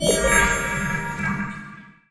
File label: notification galactic focus; Stellaris; federations